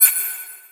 menu click; Sunset Horizons; seysant

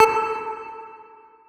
key confirm; neox-skins; mathi ensalada v1.5 (Yellow cursor)

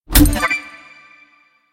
menuhit; Stargvze v2

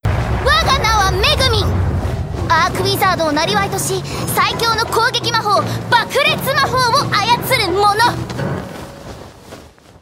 applause; AngeLMegumin (Remake)

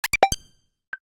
match leave; saiyku [rby] instafade

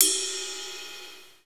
normal hit whistle

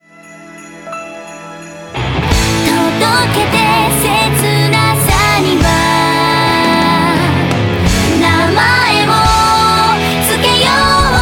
applause

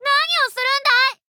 section fail; テンネンスイ・フリーナ; kodama

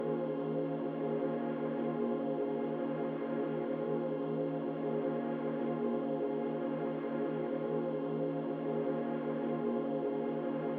pause loop

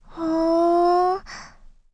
section fail; BlackCatSkin; BlackCatSiRi

